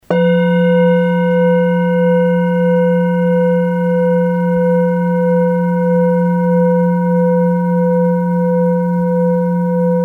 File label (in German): klangschale; 900g; boen